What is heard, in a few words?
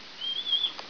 rat